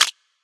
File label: gambling mouseclick; games; Fenix Play 27 Deluxe; Wazdan